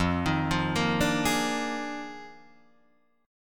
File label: F Minor 13th, Guitar Drop D